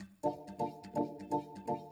E PIANO 125, Keys; Houseworx

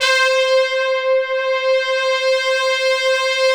brass, synthesizers; SD1 STAR

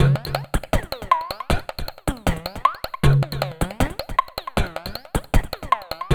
SYN SYNC; AMG - Now CD-ROM (Roland); Pure Gold

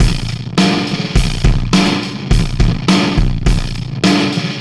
System Overload Beat, Processed Drumkits

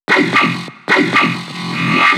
BASS SHOT

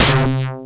blips, opl3

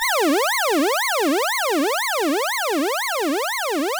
Alarm (120BPM)

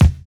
KICKING; Univers Sons - Kitch 70s

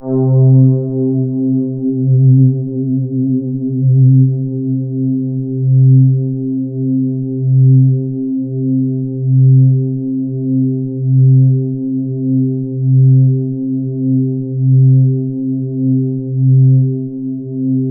C3, Synth Line

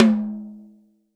TOM, Real Drum Kit, Rock Kit; Best Service ProSamples vol.43 - Real Drum Kits

